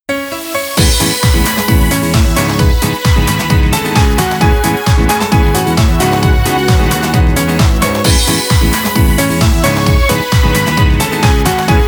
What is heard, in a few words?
Bouncy House version